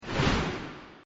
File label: enter woosh